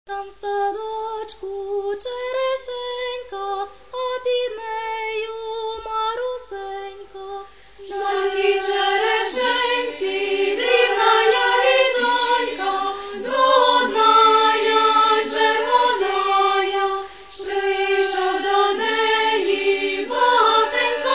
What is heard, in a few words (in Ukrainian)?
koljadky i shchedrivky